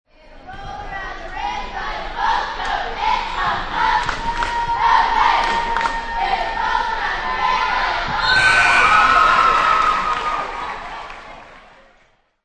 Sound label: Basketball Cheerleaders Chanting, Indoor cheerleader; Indoor cheerleader: Basketball game. Cheerleaders chanting.